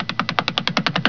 RUN FAST; MOVEMENT

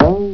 BOING HI; EFFECTS

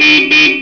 HORN

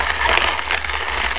FRYING

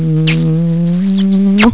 KISS FEM; PEOPLE

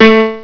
piano

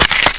SQUISH

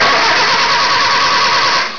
STARTER; TRANSPOR

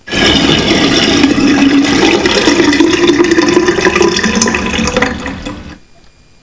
GURGLING

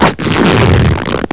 RIP TORN

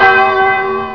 BELL LO, ALARMS

